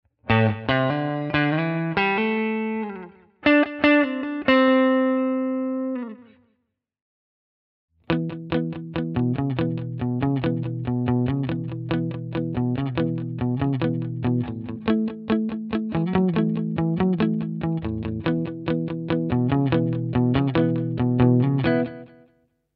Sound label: Vibrolux